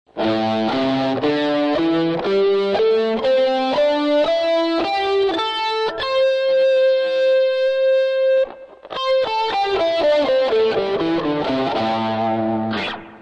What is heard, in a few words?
basic box